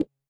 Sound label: THROAT; Spectrasonics; Metamorphosis; THROATERS